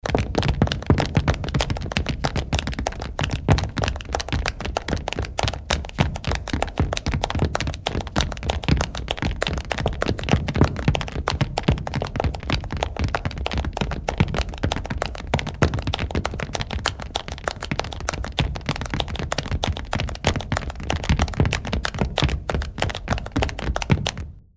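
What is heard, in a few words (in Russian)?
Люди бегут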